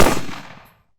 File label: Gunshot